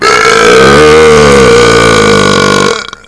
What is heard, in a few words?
belchs